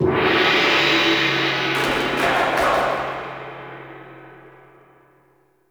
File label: Ball Gong Hit; Warframe; Missions; Tenno Ball